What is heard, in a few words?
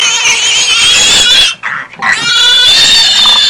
Screaming Pig